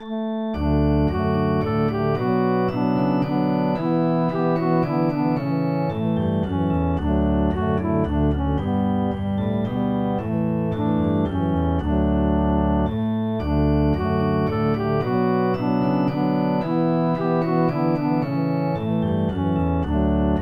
Melody Harmony Bass; Let All Things Now Living

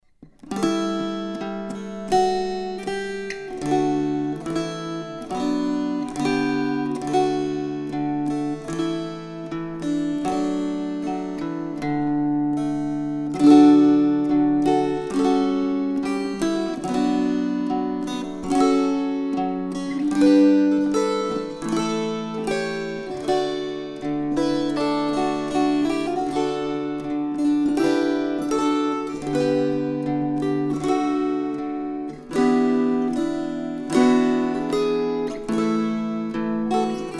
String Choir

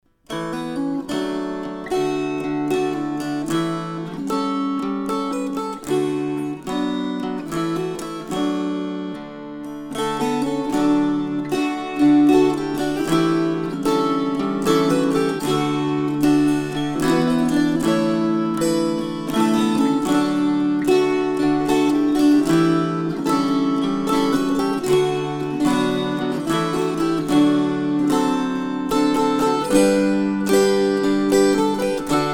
String Choir

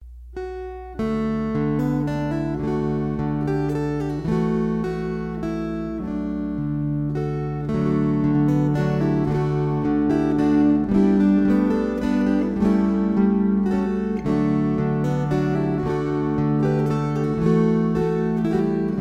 Waltzes

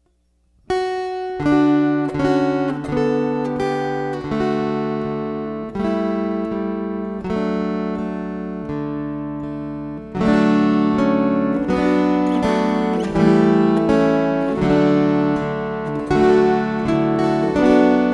String Choir

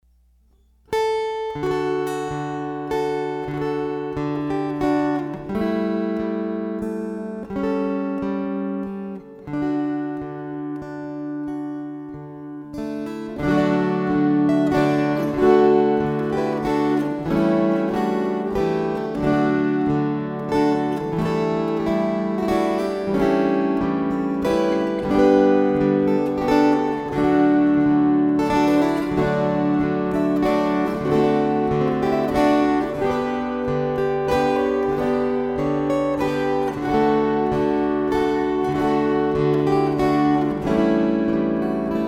String Choir

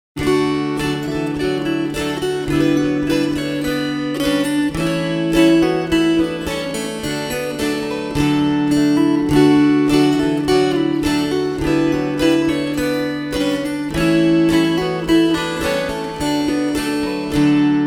slow; Eighth January

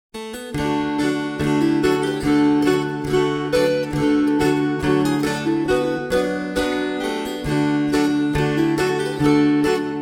March fast

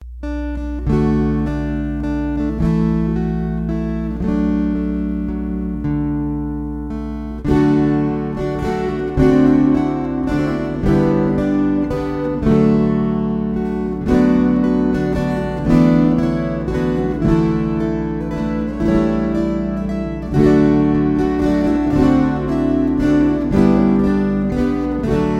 Waltzes

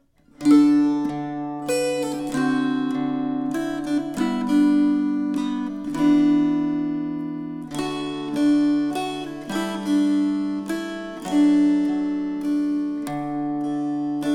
String Choir

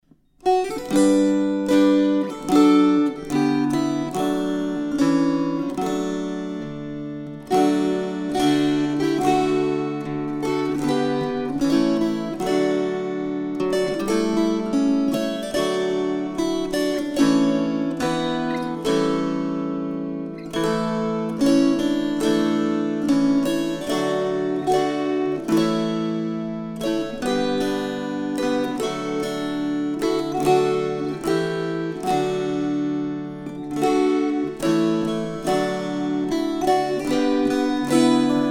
String Choir

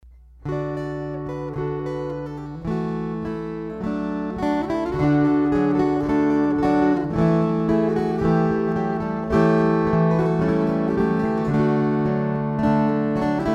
String Choir

